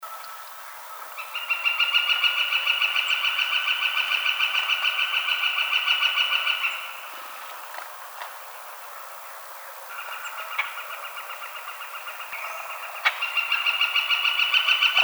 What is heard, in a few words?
Northern Flicker